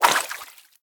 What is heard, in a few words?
Jelly Fish Throw; Lagoon